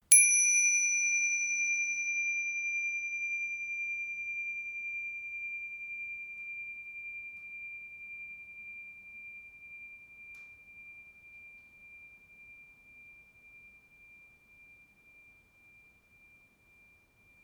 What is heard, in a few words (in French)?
tingshas 53mm